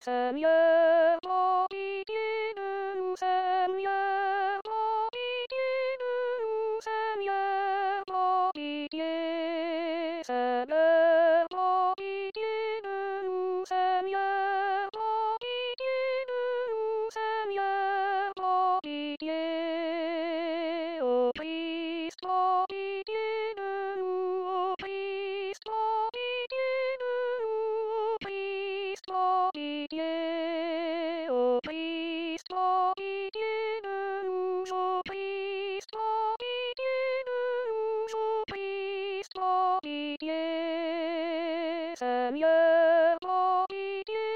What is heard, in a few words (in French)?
soprano; messe de la trinite